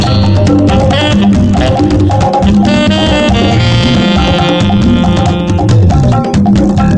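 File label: rhythm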